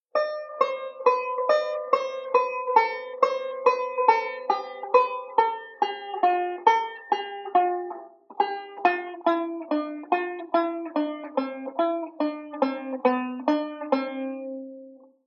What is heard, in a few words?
Returning Scale Reverse, banjo; Beyond Chord Melody Volume II